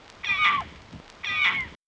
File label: tiger cub